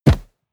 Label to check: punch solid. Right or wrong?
Right